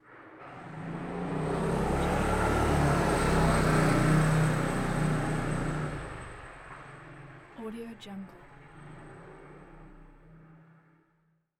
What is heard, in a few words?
narrow city alley motorcycle passby